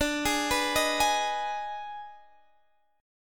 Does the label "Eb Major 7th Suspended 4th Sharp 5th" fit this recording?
Yes